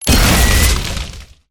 gunstand flamethrower fire burst, turret; gow; turret judgement

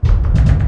closed, bus door; vehicles; sligwolf

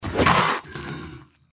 deathclaw strike; npc

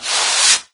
foot drag; doom3

